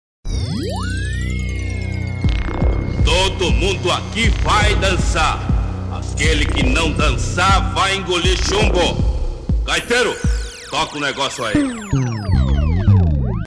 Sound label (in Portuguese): forro remelexo abertura